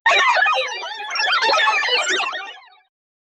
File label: funny sound effects, cartoon